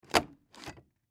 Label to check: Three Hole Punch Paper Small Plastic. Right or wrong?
Right